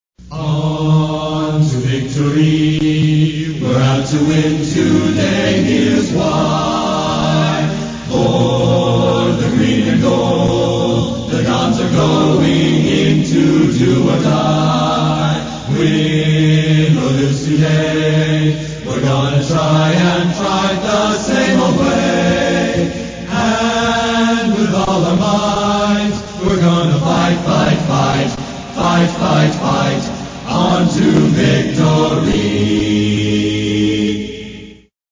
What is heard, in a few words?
Fight Song vocal; San Francisco; USF